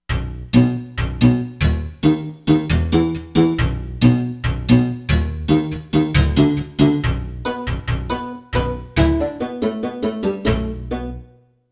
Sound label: Metal Bass, Korg MSC-13S Ethnic 2